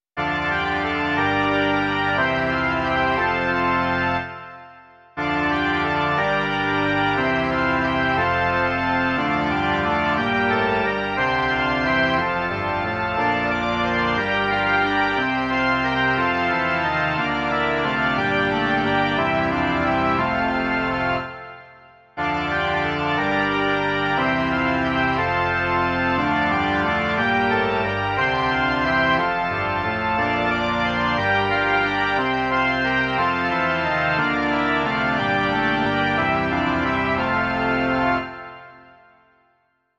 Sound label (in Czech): varhany; Sláva, sláva na výsostech Bohu